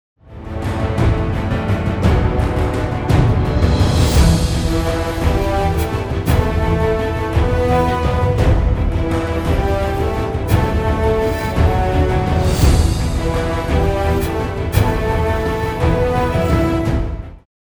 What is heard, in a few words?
Less reverb much clearer; Mixing Mistake 4